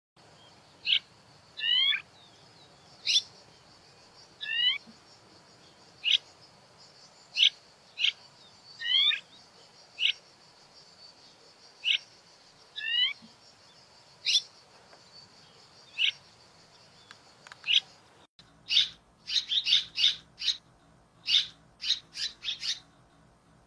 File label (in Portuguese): Canto da andorinha daurica